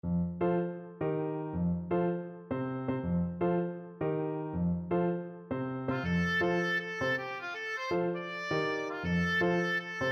gotta dance, oboe

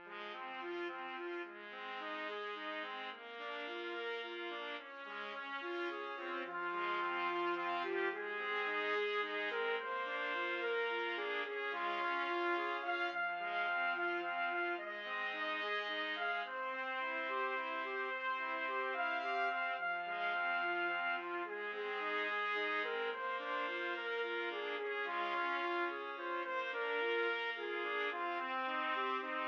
house of the rising sun TPT trio, trumpet trio

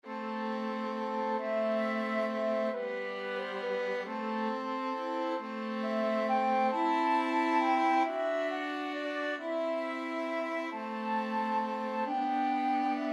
flute violin viola

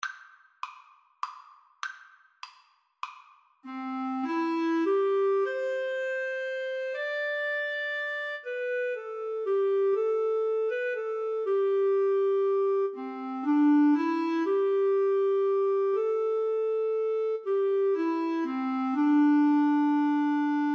Guitar-Clarinet Duet

